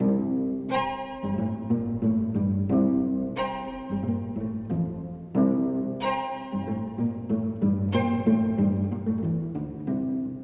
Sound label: String Quartet Version